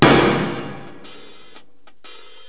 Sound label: bang highhat